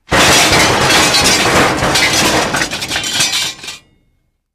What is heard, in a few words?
Huge Metal Crash Clat